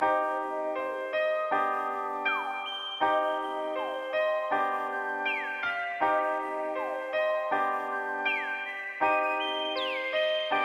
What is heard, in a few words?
160 BPM C min